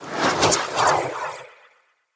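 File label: Staff Caster Projectile Whizz By; Weapons; Player; Staves; Starter Staff Caster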